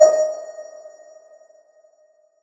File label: Riddim Melodic Hit D# Glow; One-Shots; Ghosthack; Riddim Essentials Volume 2